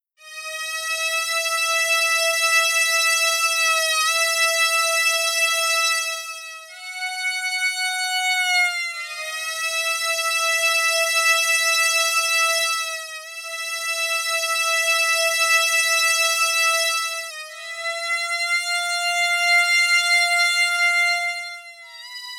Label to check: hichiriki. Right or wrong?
Right